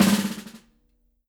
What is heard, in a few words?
Snr Buzz